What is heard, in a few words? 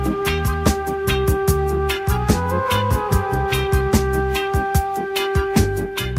indian music